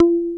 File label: F-4 PICKED, METALLOID; AKAI S-Series CD-ROM Sound Library VOL-7